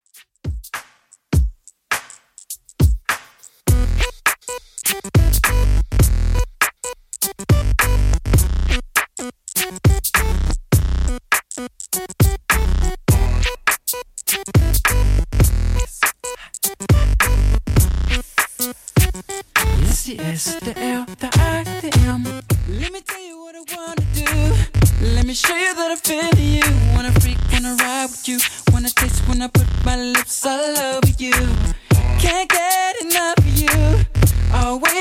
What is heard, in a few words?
Throwback Rnb 102 bpm CLEAN